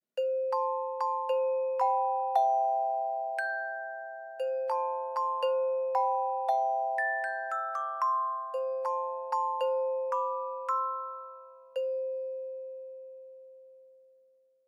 SM 1000 - mit zwei Schlägeln; studio-49

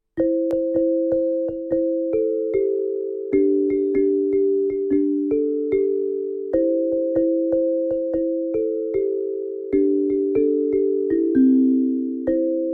AM 500 - mit zwei Schlägeln; studio-49

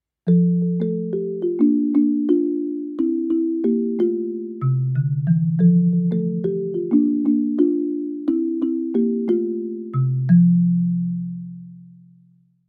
BXG 1000 - mit zwei Schlägeln; studio-49

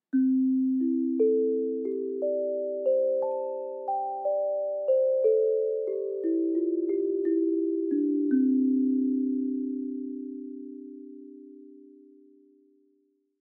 AM 1000 - mit einem Schlägel; studio-49

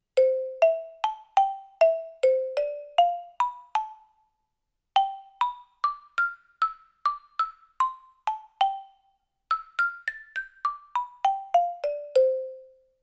SX 1000 mit einem Schlägel; studio-49

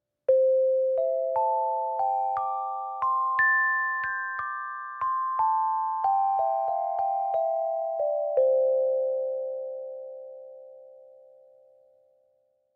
SM 2000 - mit einem Schlägel; studio-49

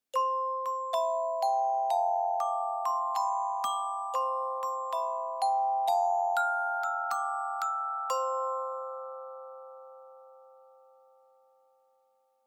AGd - mit zwei Schlägeln; studio-49

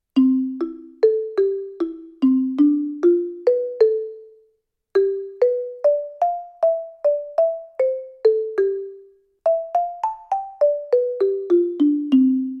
AX 1600 - mit einem Schlägel; studio-49

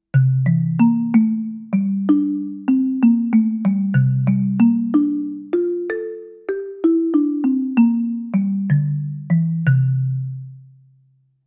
BX 2000 - mit einem Schlägel; studio-49

